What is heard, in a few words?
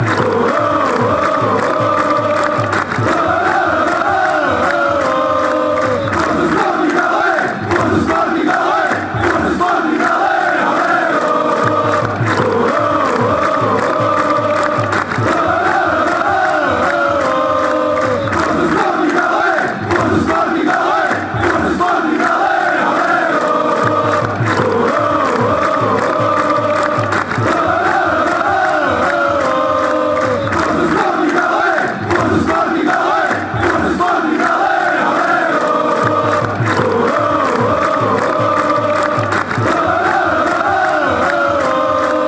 allez, fanchants; go sporting